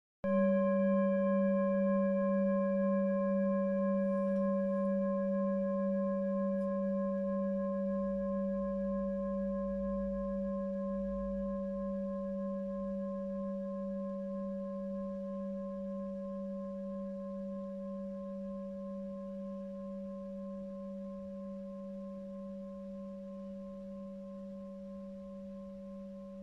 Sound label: Gong